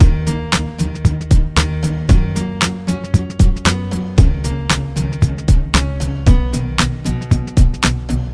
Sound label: Free Hip Hop